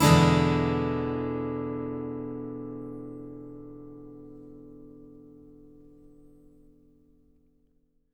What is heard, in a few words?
ACCGTR 7TH; Country